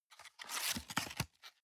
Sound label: box small tear apart hard; industrie wirtschaft; bueroarbeit